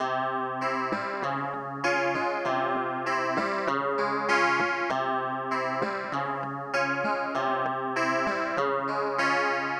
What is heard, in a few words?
Clavinet Cow Boy C 98; Sample Banks; Melody; Keyboards